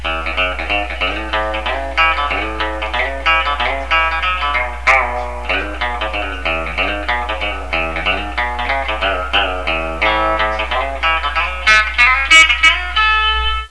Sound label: out of tune twang